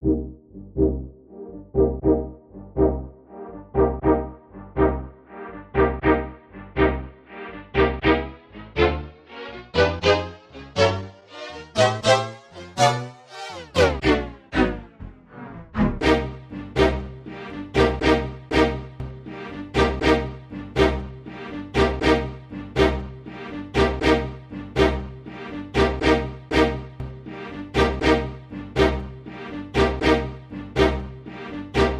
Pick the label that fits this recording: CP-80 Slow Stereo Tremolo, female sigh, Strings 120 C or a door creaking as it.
Strings 120 C